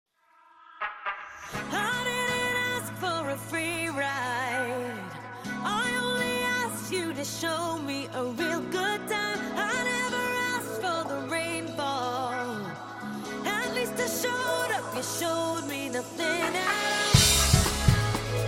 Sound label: DRUM COVER